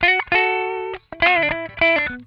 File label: LICK IT, Funk Guitar; Zero G - Funk Guitar